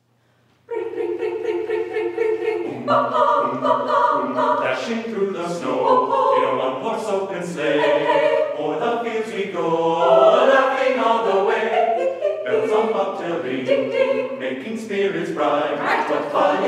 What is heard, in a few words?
Jingle Bells